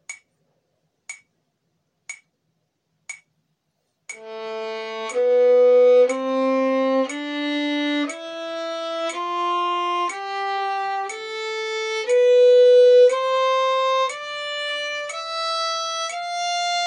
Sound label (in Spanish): Escala de la menor con metronomo